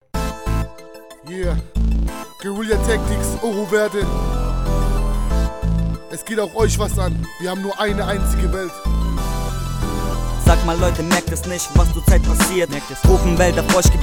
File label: rap 4 rainforest